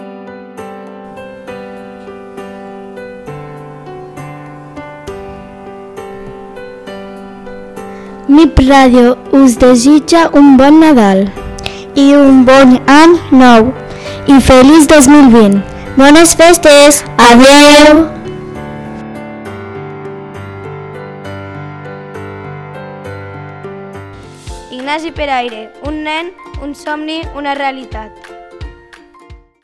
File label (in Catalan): jingle nadal